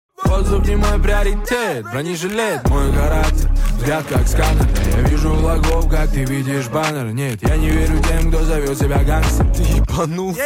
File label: rap